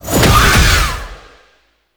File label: Infested Shotgun Teleport; Weapons